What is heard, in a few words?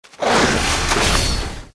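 Lizard attack mid voice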